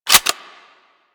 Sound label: Lever Action Rifle Reload, Weapons; Warframe; Tenno